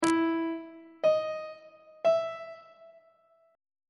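f flat diminished 8 perfect 8 intervals on treble clef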